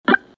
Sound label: Click Spin